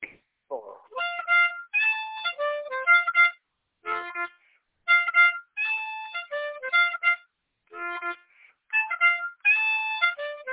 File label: high lick on low f harmonica